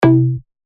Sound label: bass shot C; Tag; revealed bass shots vol 4